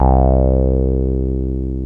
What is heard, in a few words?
TB BASS